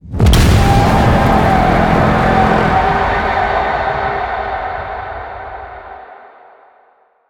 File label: Blast Off, Forest Night; Quests; Sacrifice